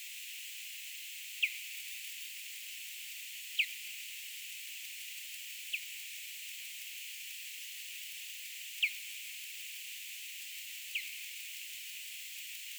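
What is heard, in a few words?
Plecotus teneriffae, Tenerife Las Lajas; J-Barataud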